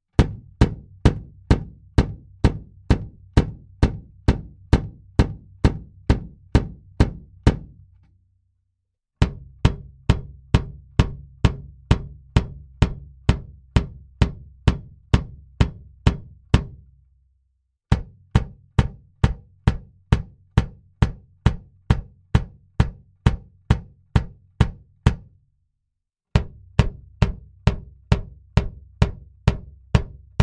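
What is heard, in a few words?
bass drum Mic Test M201TG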